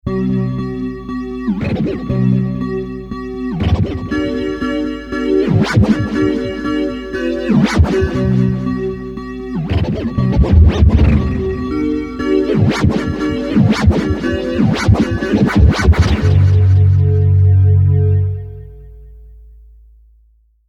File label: microkorg